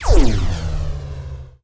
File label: Deploying Whizz Whoosh